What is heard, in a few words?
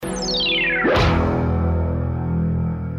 Breaking News